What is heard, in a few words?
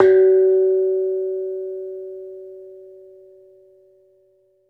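BONANG, Metalophones, Pitched Percussion; E-MU Producer Series Vol. 7 – Old World Instruments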